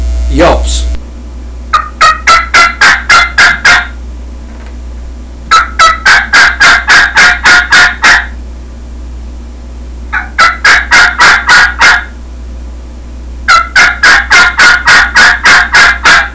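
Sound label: raspy triple yelps, turkey call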